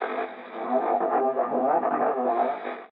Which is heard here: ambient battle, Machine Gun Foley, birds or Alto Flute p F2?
ambient battle